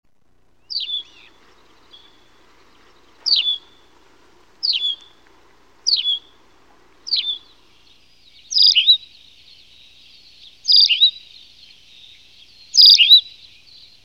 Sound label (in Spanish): bisbita campestre